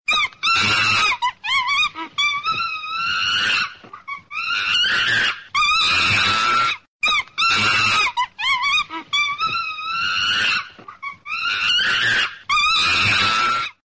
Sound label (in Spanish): chimpance enojado; animals